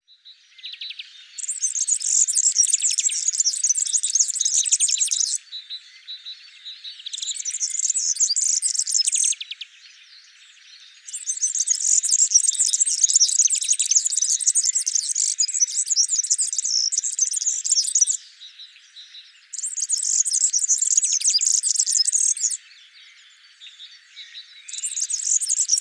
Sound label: Serinus serinus